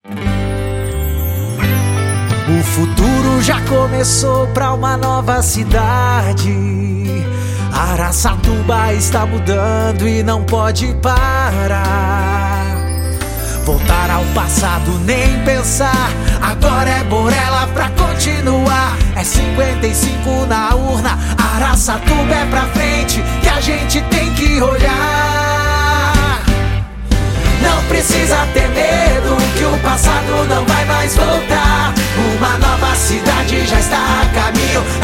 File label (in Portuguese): jingle politico campanha eleitoral; borella